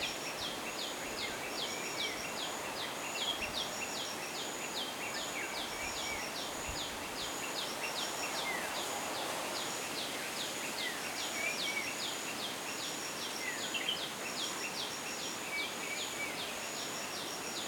Amb Base Day; Botanist

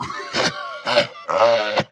Swamp boss Roar

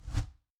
woosh; Ion Shift

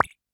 Ui Item Select

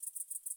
Grasshopper-Chirp

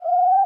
Amb Bird-Night Coo Rev

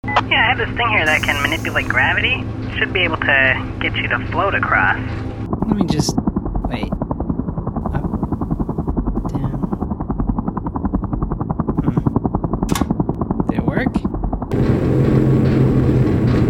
Grav bubble; The Henry Stickmin Collection

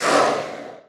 Finalboss Vox Damage

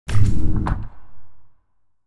Gate Close; Sound Object Dungeon2

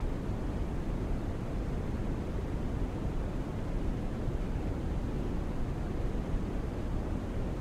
Fall Wind Brown-Noise

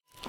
Footstep Solar-Panel Rev; viewfinder

